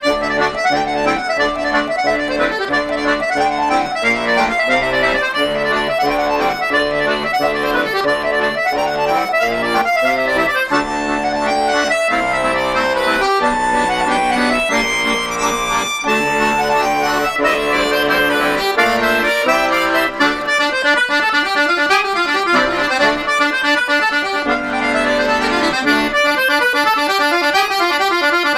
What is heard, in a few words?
Irish Fusion